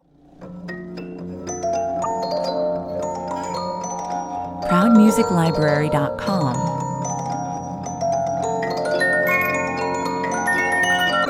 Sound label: rippling waltz music box